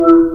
facetime connect

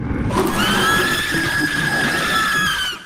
long scream; huggy wuggy

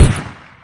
real gunshot

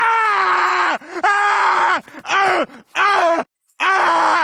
screaming; peter griffin

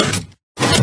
piston minecraft

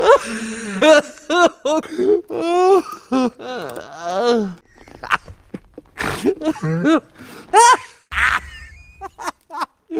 laughing; deadpool

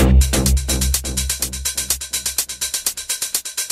techno song; iconic belgian

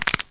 neck crack

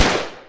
pistol fire

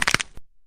bone snap crunch

